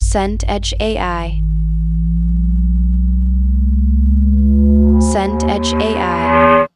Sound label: transition drone riser